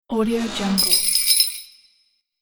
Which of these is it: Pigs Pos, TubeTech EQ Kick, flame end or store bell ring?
store bell ring